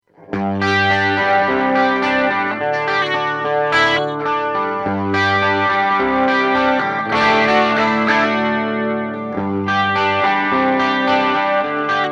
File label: Zoom G6